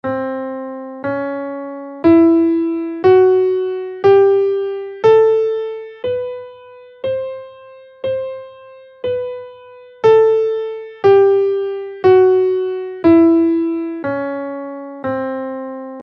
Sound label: marva scale in C